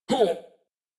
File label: Death, en; Warframe; New War; Teshin